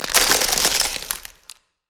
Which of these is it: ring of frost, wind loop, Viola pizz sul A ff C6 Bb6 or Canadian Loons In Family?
ring of frost